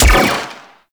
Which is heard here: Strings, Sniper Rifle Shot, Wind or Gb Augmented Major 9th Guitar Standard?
Sniper Rifle Shot